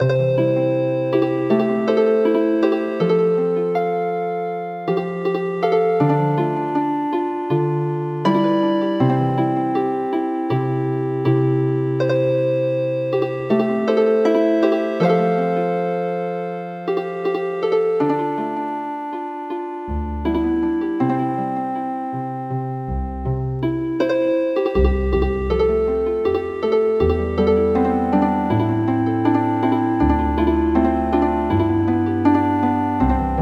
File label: Healin Harp